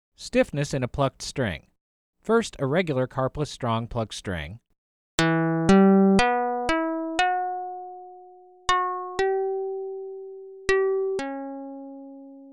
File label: String Stiffness Modeling